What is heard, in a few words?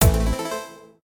count up end; Fruit Express